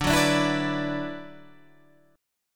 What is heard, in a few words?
D 6th Add 9th D, Guitar, Standard; strummed; capo 9